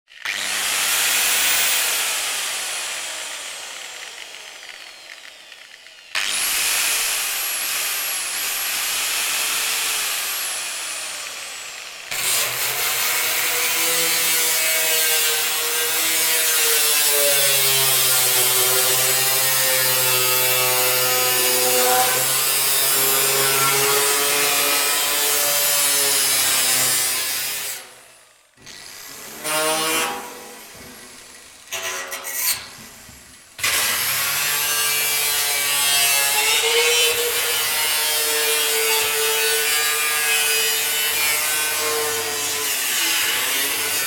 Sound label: angle grinder metal; zapsplat